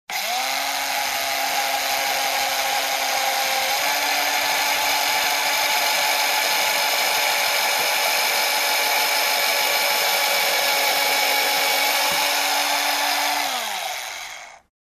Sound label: Electric Mixer turned on and off